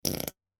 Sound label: Fart